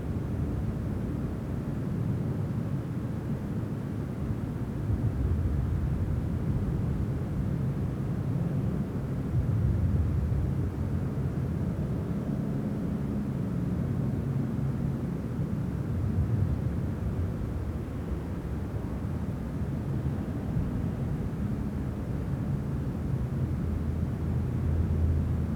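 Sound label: Ambience Interior; Pilot Down Behind Enemy Lines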